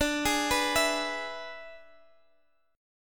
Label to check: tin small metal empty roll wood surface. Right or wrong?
Wrong